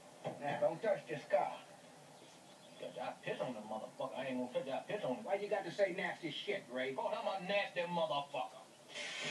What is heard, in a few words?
Ringtone